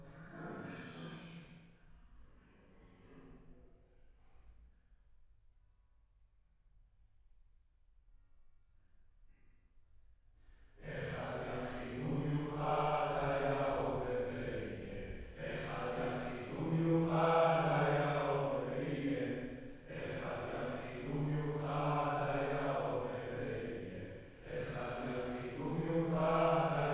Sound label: Choir